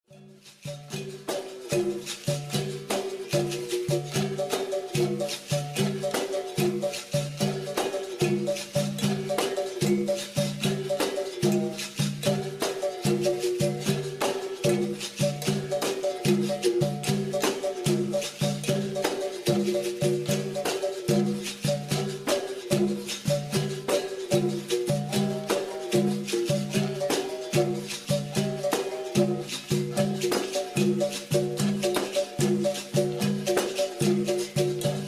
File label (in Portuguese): toque, capoeira